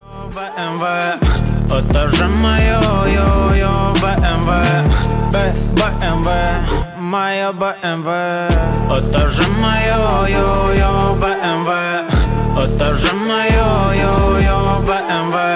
bmw song ringtone

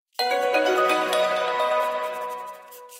android message tone ringtone

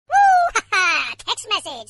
MESSAGE NOTIFICATION RINGTONE

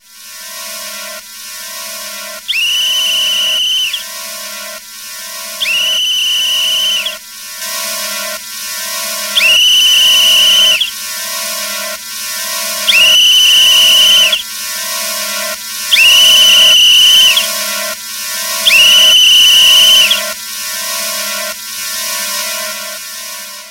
EXTREME WAKE UP SOUND RINGTONE

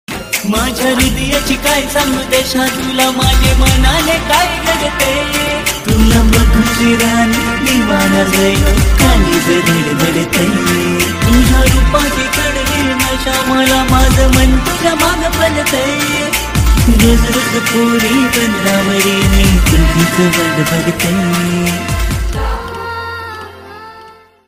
MARATHI LOVE SONG RINGTONE

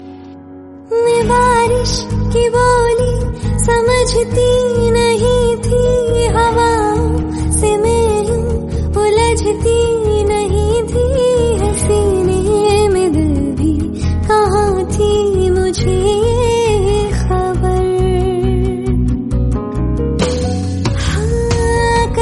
FEMALE RINGTONE

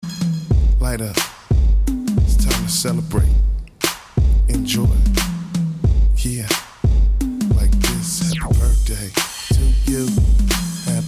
HIP HOP BIRTH DAY RINGTONE

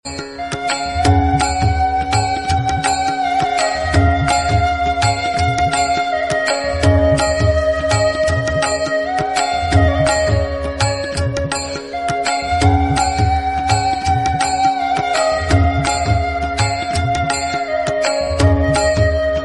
FLUTE RINGTONE

